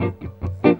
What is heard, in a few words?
EGUIT CHORDS; Best Service; World Colours